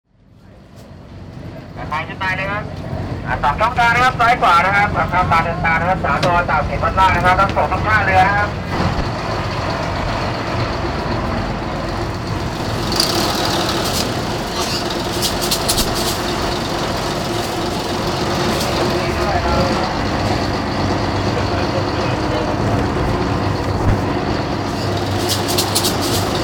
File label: BOAT CHAOPRAYA